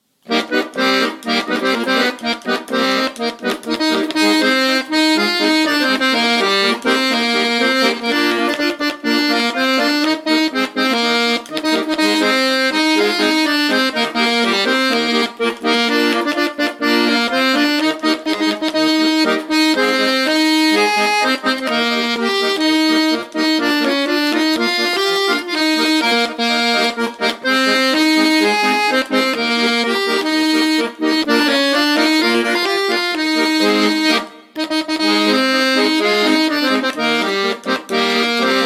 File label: Polka